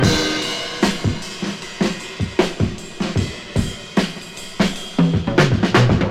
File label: 79 bpm drum loop d sharp key, kick tuned to the D# note